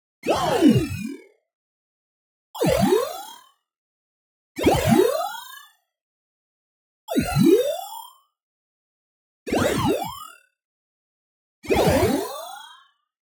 tonal, talking; boom library; Anime Essentials

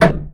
Menu Click; Menu FX

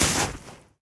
Bodyfall Wet Snow; SFX Kit; Bodyfalls

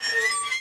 Squeak Wood Porch Swing; SFX Kit

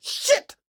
histeric short; donnie; Blind Drive

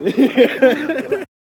laugh; Pitch Black - A Detective's Demise

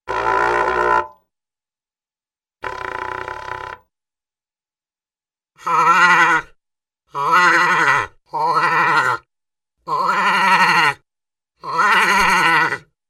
Muted Trumpet - Gauge Horn; Cartoon; Hollywood Edge; Cartoon Trax

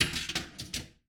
Pinball Ball Launch

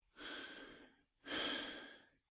Breathe - Half breathless; Eden; RadwinIsland

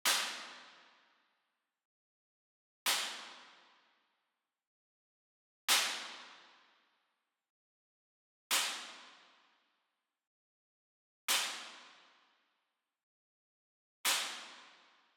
Sweetener Whip Crack Hit Far; boom library; Cinematic Strikes Construction Kit

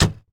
Humvee Door Close; Trucks

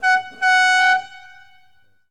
Ferrari Testarosa Horn; Automobiles

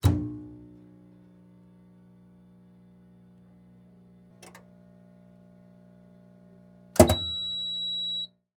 X-Ray Machine Turn On; Medical; Blastwave

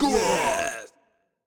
Crowd Cheer; Pitch Black Audio Pong

